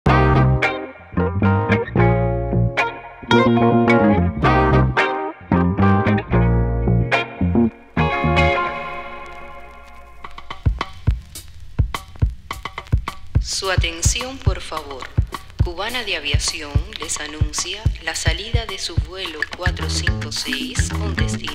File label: Afro Cuban Funky Grooves